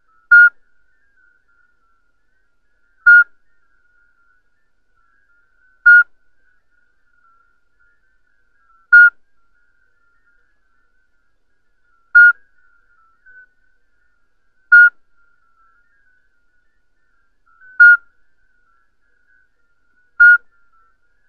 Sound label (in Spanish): SAPO PARTERO IBERICO